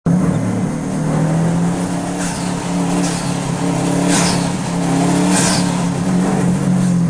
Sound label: blow off valve